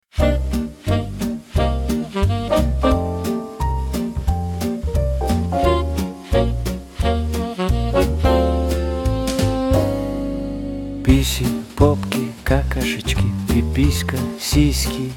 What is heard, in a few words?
Cool-jazz